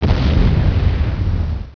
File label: EXPLO